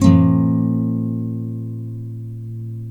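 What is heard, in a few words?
NYLON, B MINOR UP, CHORDS; Spectrasonics; Hans Zimmer Guitars VOL-1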